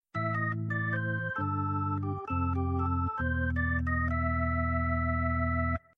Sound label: jazz solo